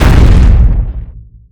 wall boulder; Golden Crayon Sounds

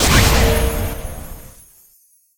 health orb start; Original TK Sounds

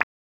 volume, Galaxy TalkBack

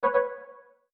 Wrap Forward Louder; Salem's Sound Schemes

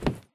wood step; Golden Crayon Sounds

